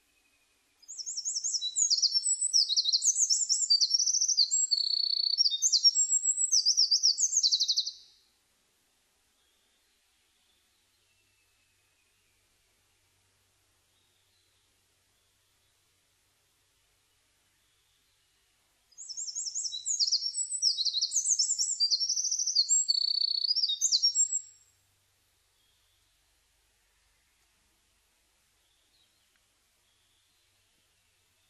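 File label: Eurasian wren; misosazai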